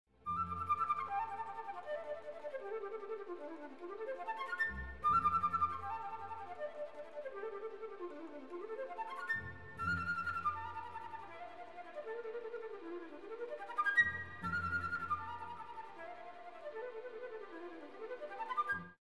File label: hummingbird; AnimalAntics